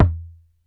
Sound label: Foley lo; layering